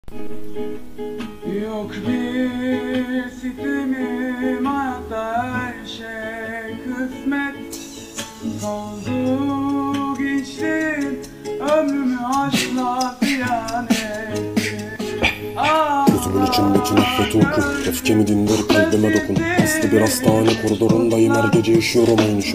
trap remix slowed reverb

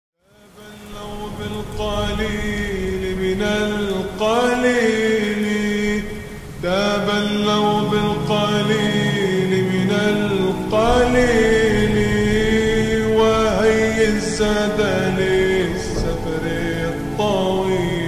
Nasheed Slowed Reverb Rain Sound

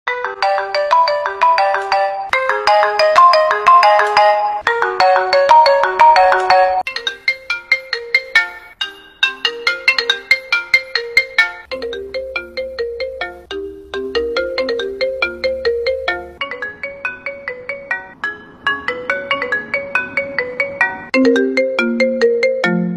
Iphone Ringtone Evolution

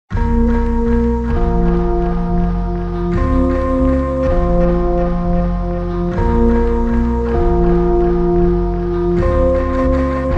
slowed reverb

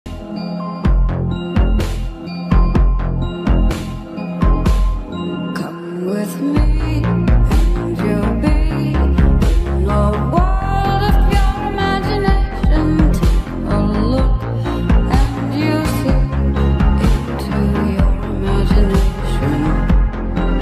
remix 80s

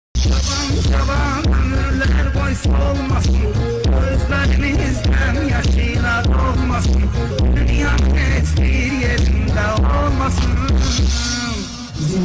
Azeri bass music remix bass; yeni mahni

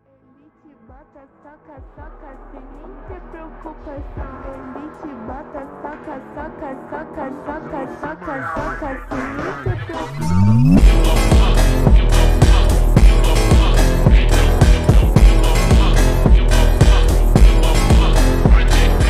phonk mashup; p4nmusic

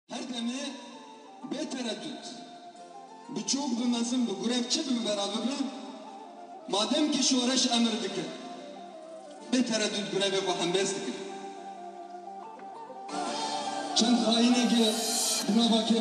kurdish trap remix

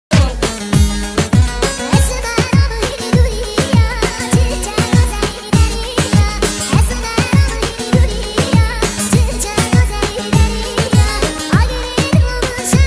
kurdish trap remix

